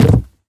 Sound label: wood fall; Golden Crayon Sounds